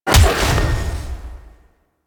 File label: Melee Jump Slam Impact, Whooshes; Weapons; Warframe